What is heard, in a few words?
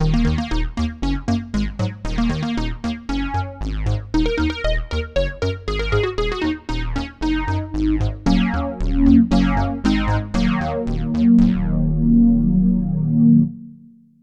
Sqr Poly